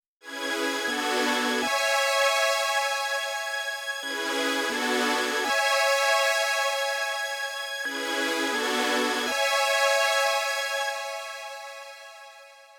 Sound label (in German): realistische streicher house strings; syntakt workshop